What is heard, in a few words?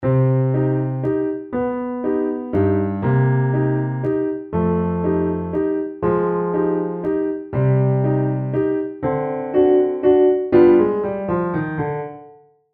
ReHarmonized Version